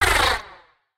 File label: snort; the wolfs night